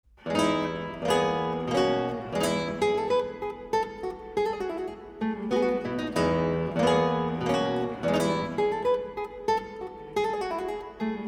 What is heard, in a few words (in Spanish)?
guitarra